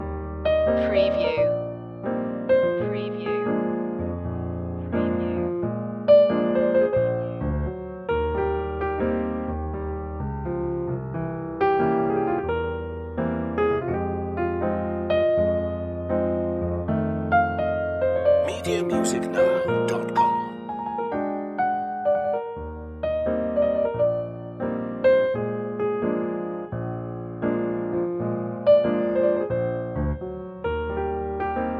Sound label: loop, calm jazz